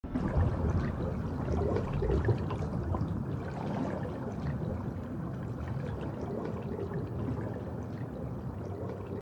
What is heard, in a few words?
water